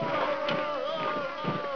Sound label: march; Caesar 2